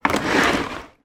toy plastic bathroom toy box drag across hard floor